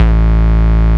Bass Root A

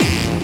BD Distortion, Bassdrums; Vengeance Samples

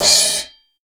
cym; house; PS-28 Vocal House Samples; cymbals; Drum & Perc

